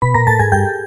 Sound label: error